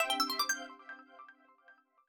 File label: 1up; Smbw